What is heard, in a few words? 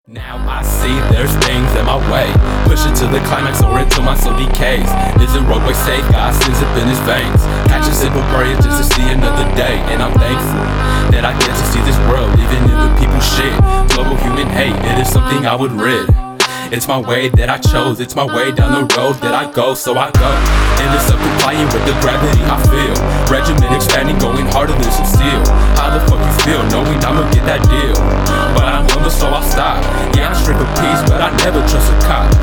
call sound